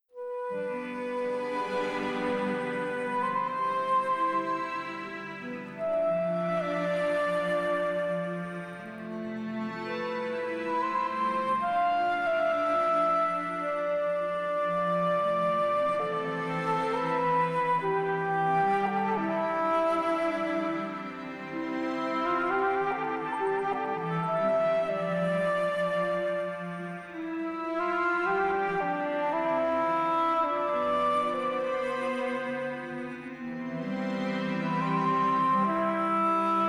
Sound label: Short Adagio for Alto Flute and Strings